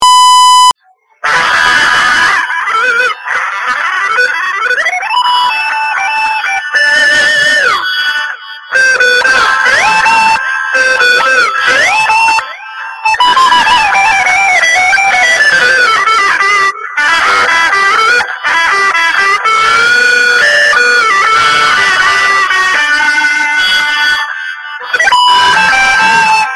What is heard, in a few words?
guitar gospel